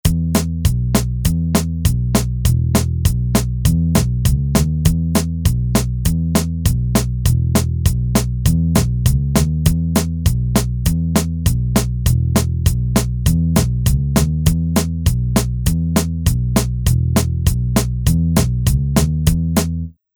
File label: Country